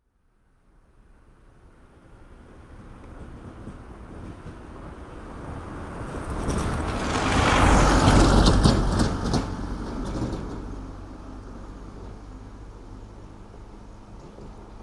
passby volkswagen transporter medium speed on road in bad condition stereo; fvtt sonniss gdc audio